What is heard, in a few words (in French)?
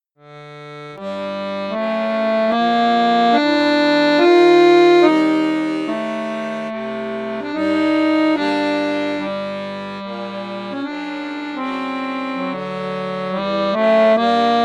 accordeon, registre 16; bugari 580 ars c